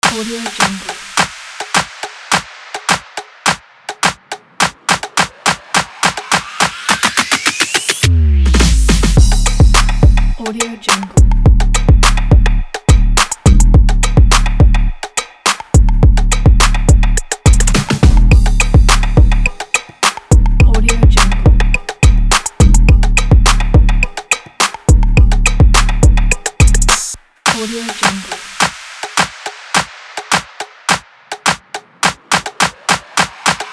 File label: rap beat